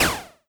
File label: standard shot